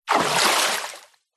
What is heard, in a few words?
nature water movement surface splash leg; zapsplat